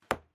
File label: archery foley arrow impact